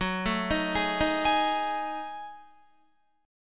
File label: Gb Minor 6th Add 9th Gb down Guitar; Gbm6add9; arpeggiated